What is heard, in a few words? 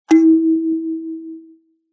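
musical kalimba tine pluck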